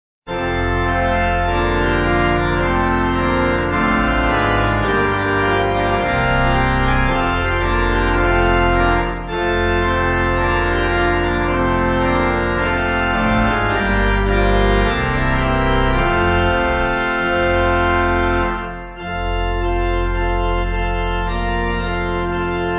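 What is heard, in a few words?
Pipe, Pipe Organ backing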